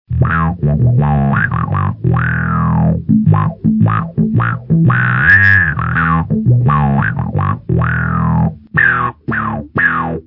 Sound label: bass envelope; bass distortion-envelope 1